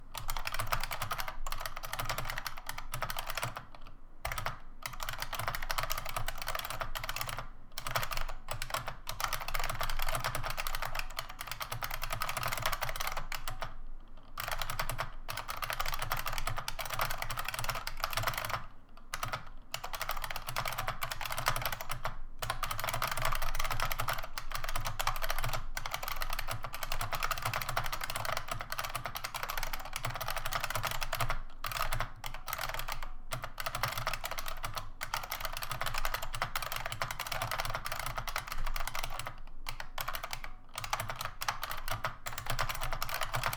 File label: Unicomp New Model M typing sample